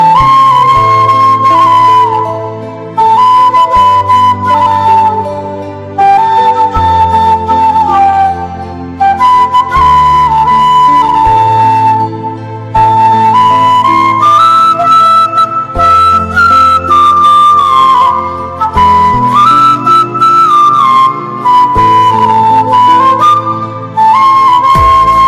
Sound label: Flute Instrumental Ringtone